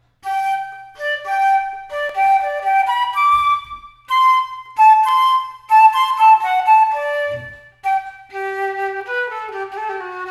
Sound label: Flute; mozart; K525; A Little Night Music; 1st Movement